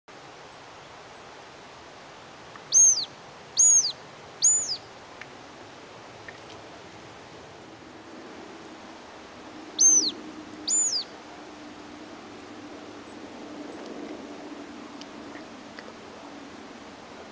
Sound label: marsh harrier